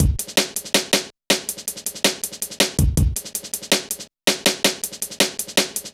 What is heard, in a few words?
Kicker, Jungle, Drum Loops 161.5